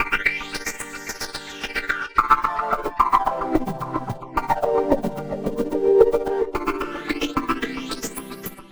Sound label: Techno Echo Arp, Arpeggios & Sequences; Dr. Fink's Funk Factory